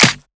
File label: clap one shot c key; percussion; single hits one shots